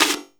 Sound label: PERC R, Drum & Bass 170 BPM; Ueberschall; Drum & Bass Flash Trackz